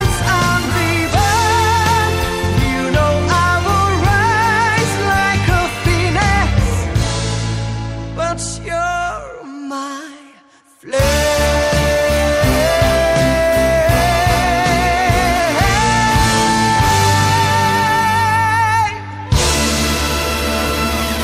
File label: austria